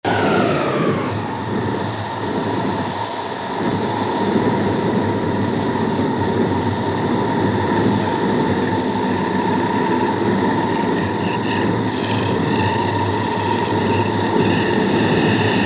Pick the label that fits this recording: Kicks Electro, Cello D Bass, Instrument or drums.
drums